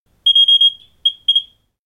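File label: Alarm Electronic Beep; hi-tech-various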